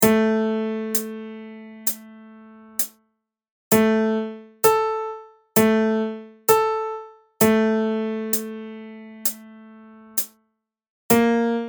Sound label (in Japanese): 地声裏声 Women